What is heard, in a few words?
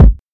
fluffy kick drum single hit g sharp key, kick drum sample tuned to the G# note